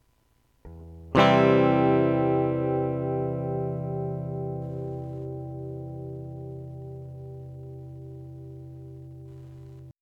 C Major

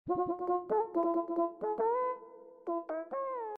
Yiddish synth

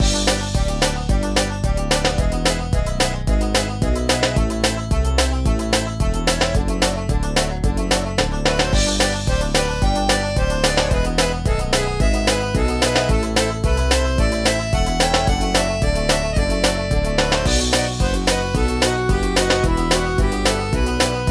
Cartoon Game Theme Loop

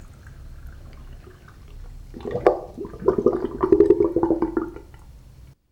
Water Drain Gurgle

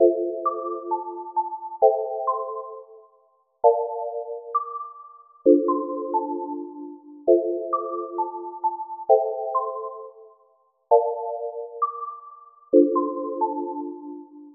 Bell Loop

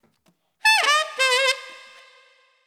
4 Beat Dm 90

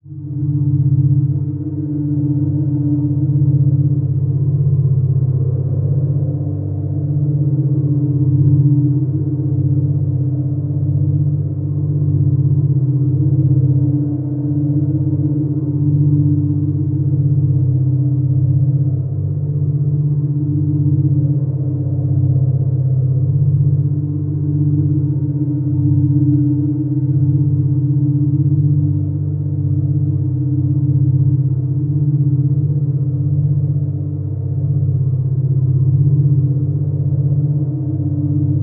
Underground Prison

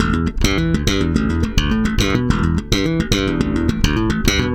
Slap Bass Riff

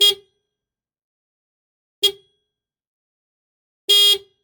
Car Horn 3 Blasts Medium Short Long

